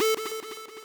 sub lead a3

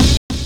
amen

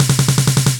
drums snare roll

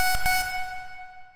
fami f#3

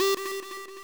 sub lead g3

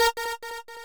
lead a#4

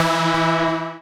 lead1 e3

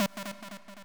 sub lead g2

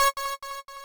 lead c#5

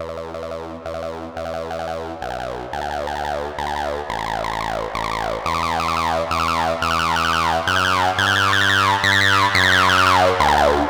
bass fill

